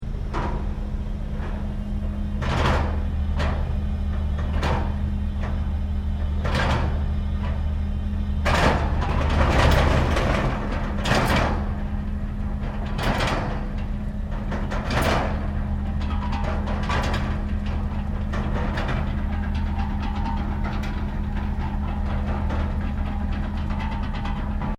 Construction Site Drilling Rig Operating Snag, Japanese construction drill site